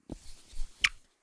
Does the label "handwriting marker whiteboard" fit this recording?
Yes